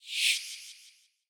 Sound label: path Magic Trail Start; reef raider